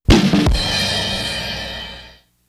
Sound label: rimshot